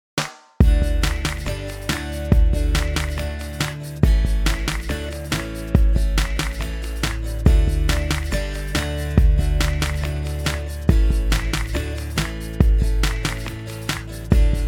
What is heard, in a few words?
DAYS MONTHS instrumental